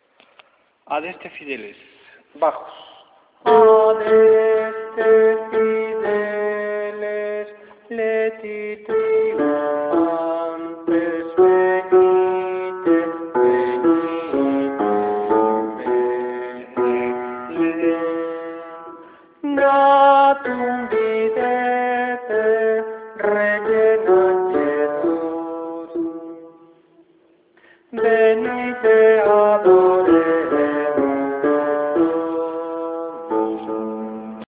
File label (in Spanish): bajos